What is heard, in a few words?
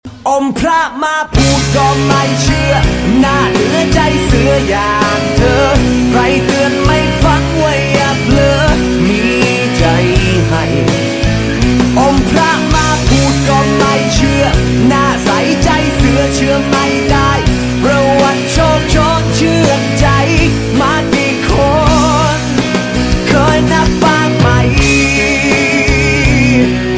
Thai Famous Song